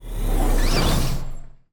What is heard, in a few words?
close hydraulic, doors futuristic; dragonroll